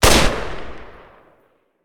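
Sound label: Weapon auto rifle fire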